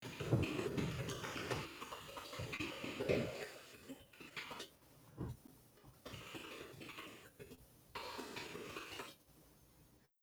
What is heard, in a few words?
static